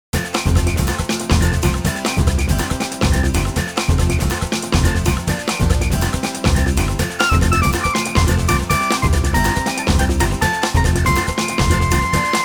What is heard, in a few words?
Mariachi; everblue 2